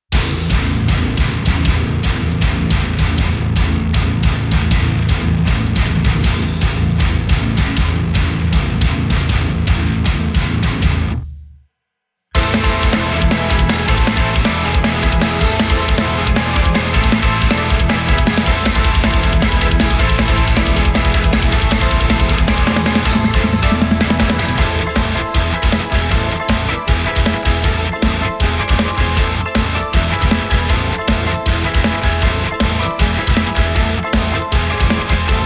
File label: Instrumental